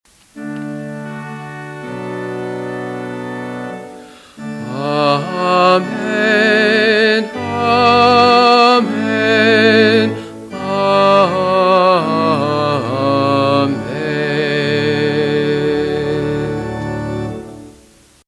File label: Amen